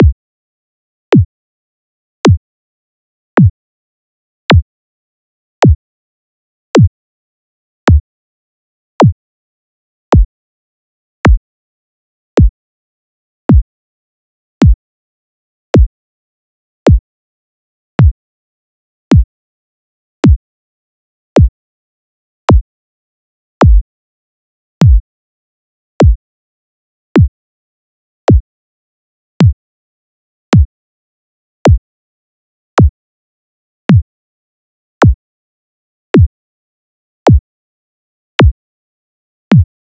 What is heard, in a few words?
Minimal Kicks